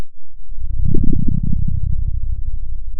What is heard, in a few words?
Sound of a dinosaur